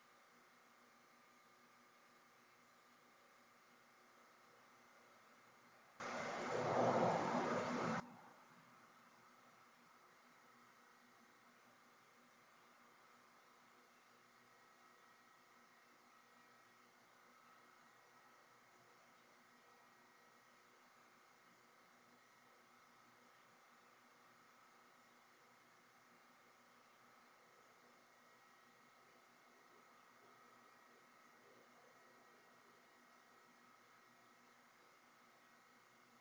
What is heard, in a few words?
Chapel Static; fairfield county infirmary return investigation